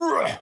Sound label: Attack Medium; Batman